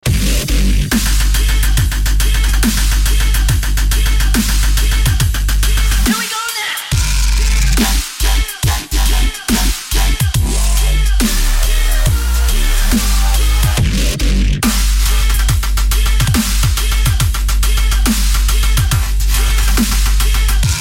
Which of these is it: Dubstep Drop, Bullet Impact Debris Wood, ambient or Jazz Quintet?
Dubstep Drop